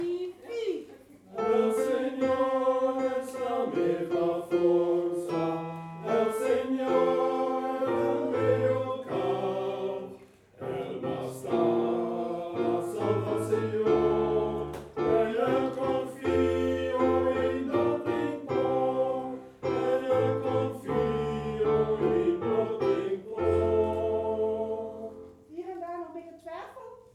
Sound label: Taize tenor; SINT-CECILIA KOOR ROTSELAAR